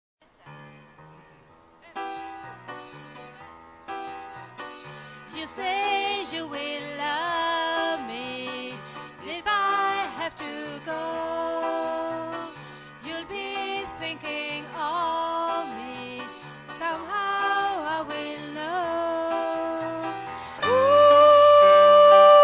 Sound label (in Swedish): tenor, stämmor